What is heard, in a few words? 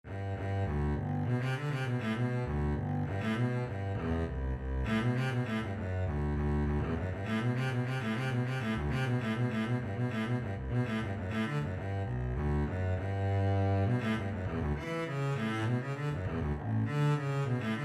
cello suite courante, double bass